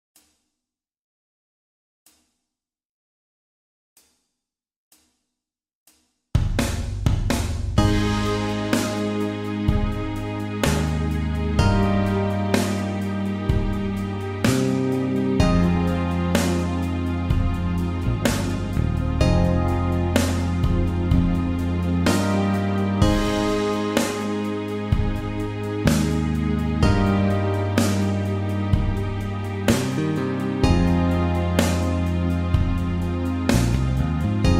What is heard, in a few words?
Guitar Backing